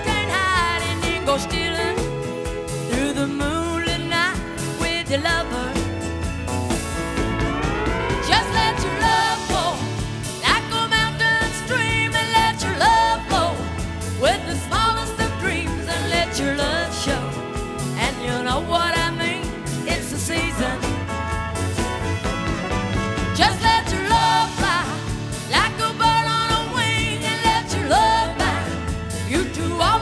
country

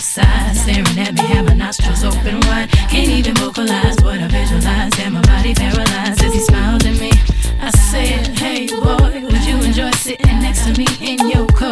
pop

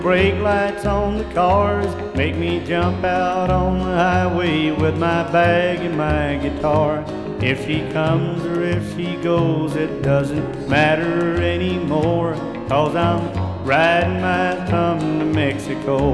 country

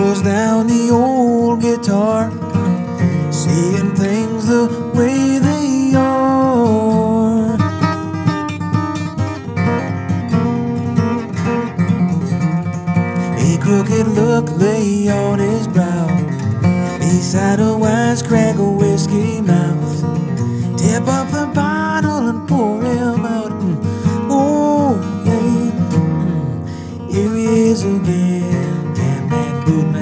blues

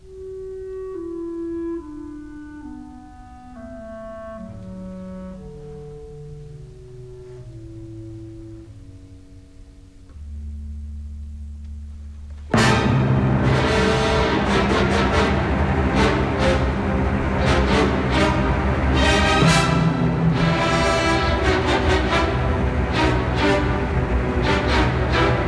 classical

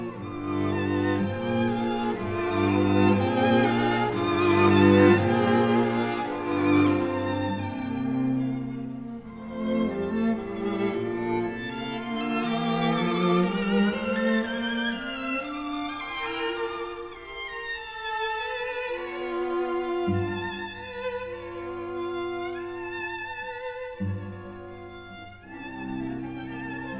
classical

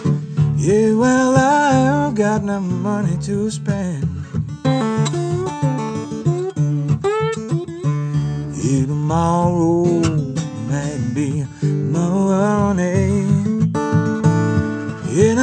blues

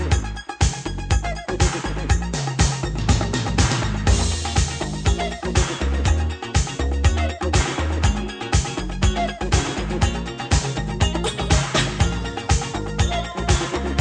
disco

